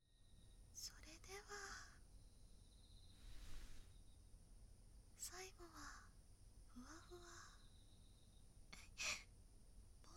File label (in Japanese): お隣さん夏 右梵天